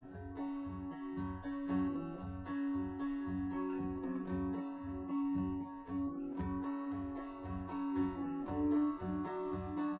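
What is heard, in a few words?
with drums, live rec; SiSEC11; underdetermined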